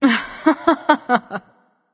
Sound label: laugh; Persephone; Smite